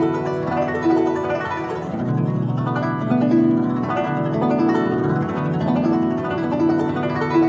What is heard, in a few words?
Traditional Guzheng; Continental Drift Aftershocks